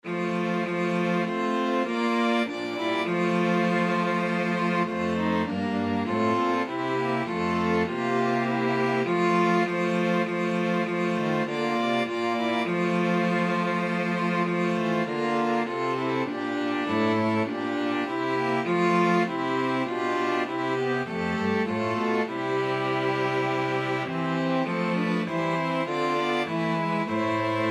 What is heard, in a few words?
string quartet